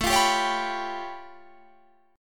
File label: A Augmented 9th A, Guitar Standard; strummed; JGuitar; 17,20,17,18,20,19